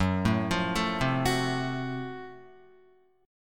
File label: Gb Minor Major 7th Flat 5th Gb Guitar; arpeggiated; 2,0,3,2,0,1; JGuitar